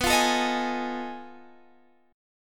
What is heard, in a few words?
F 7th Sharp 9th Flat 5th B